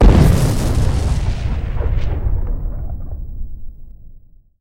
shum vzriva starogo snaryada

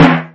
litavri odinochnij udar

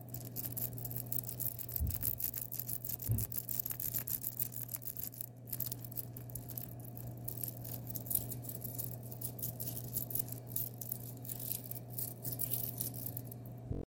potiranie brasleta o sebya v techenie neskolkih sekund

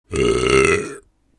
horoshaya otrizhka

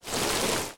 bistroe chyotkoe shurshanie morskimi kamkushkaii na beregu

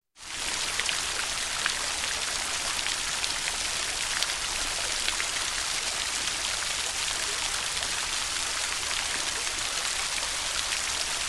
fontan

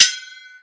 mech nizkij blizkij

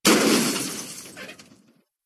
tretij variant avtokatastrofi

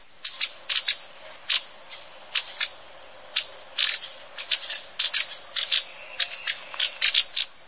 perelivchatij svist malenkoj ptichki

